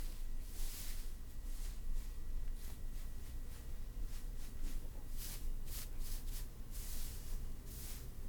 Hair Styling

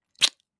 Kiss

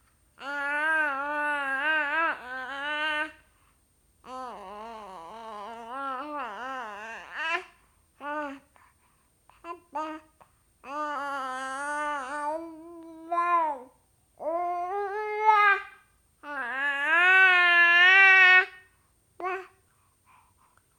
Baby Voice

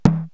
Linn Conga Low

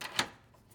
Door Lock

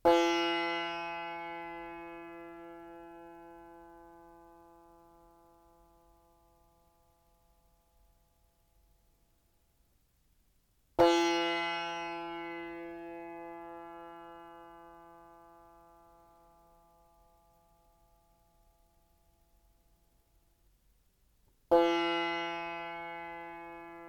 Sitar

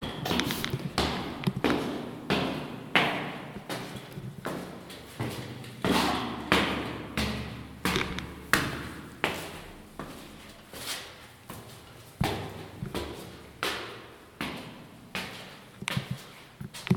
Walking In An Echoey Staircase

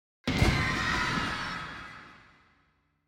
Kids With Fireworks

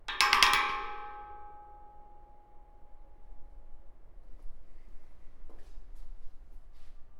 Prison Locks And Doors Prison Bar Scrape

